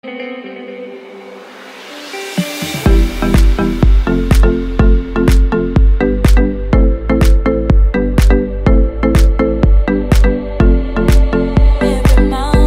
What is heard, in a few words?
lovely iphone x ringtone